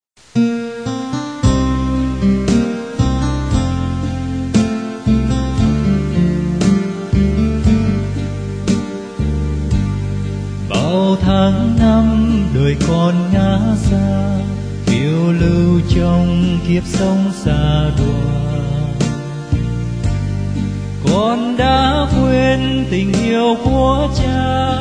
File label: BASS